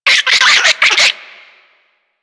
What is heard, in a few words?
Infested Minion Bark, Vocals; Warframe